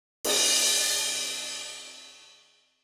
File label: cymbal